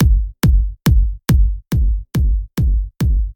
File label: limit